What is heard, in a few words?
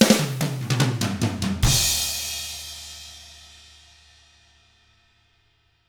Double Platinum Drums; 50S ROCK